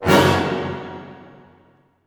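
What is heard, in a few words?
F# major fortissimo, orchestra hits; Sound On Sound - Peter Siedleczek's Orchestra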